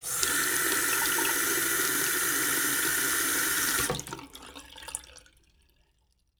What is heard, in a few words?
Sink Fill; Liquid